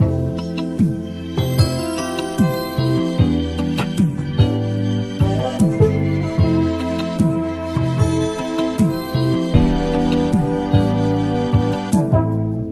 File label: horns, phonky loops